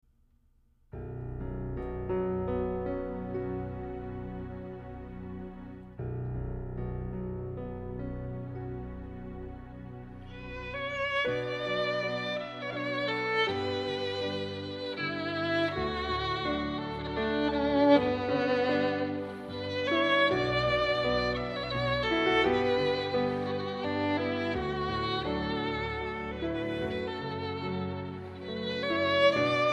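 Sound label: Celtic Air Violin & Piano; KILMAINHAM GAOL; piano and violin; traditional CELTIC MUSIC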